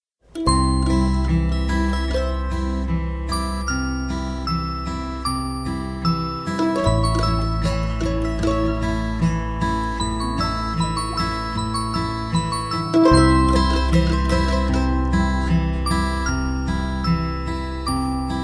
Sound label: healing elemental dance